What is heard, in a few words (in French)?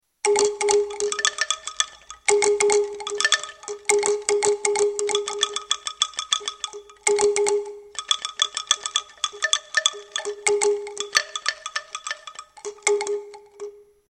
instruments angklung